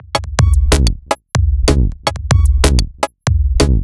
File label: 125bpm, House Drumloops